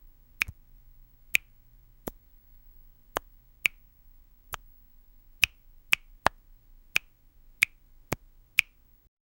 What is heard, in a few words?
tap sound; interaction